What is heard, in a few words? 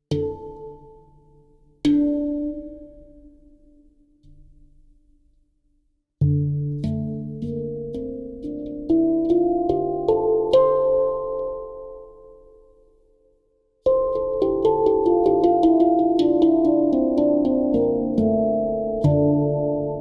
10 notes blue ethereal, handpan